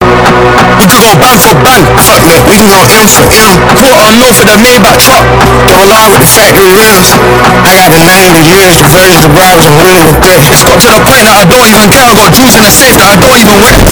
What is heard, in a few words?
earrape